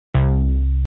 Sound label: other beluga sound